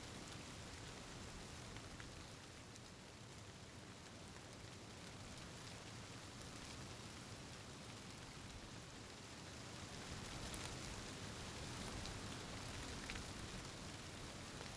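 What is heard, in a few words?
Ambient Zone Under Tree; Inmost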